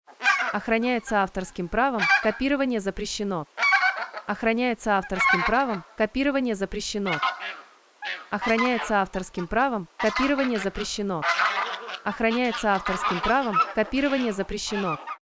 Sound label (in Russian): серые гусыни, перекличка